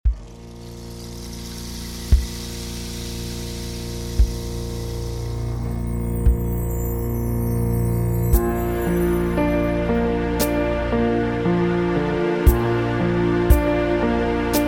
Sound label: Suo Gan Practice Tempo B Flat